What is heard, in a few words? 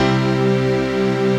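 chord pad, Pads; Best Service; XX Large - Pads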